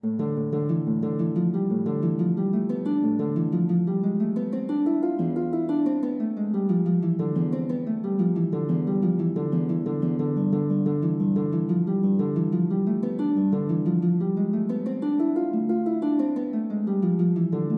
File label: gigue, lute